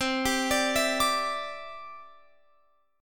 C Major Add 9th, C, down, Guitar, Open F; Cadd9 arpeggiated

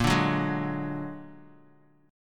A sharp Augmented 7th A sharp Bass Standard; A#+7 strummed; JGuitar

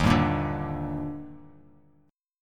Eb Major 7th, Bass Standard; EbM7; strummed; JGuitar

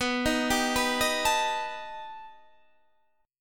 B Augmented 7th B down Guitar Standard; arpeggiated; JGuitar

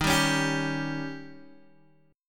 D sharp 7th D sharp, Guitar Open D

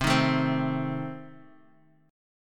C Minor 6th, C, down, chord

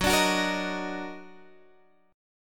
D sharp Minor Major 7th F sharp, Guitar

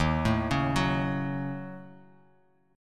Eb Minor 7th Flat 5th, down; arpeggiated; chord; JGuitar

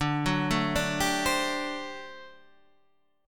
G Major 7th Suspended 2nd Suspended 4th

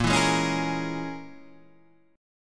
Bb Minor 7th Flat 5th, Guitar, Half Step Down

